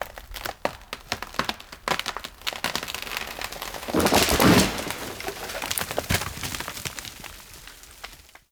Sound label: Forest Tree Fall; Warframe; Awakening Remaster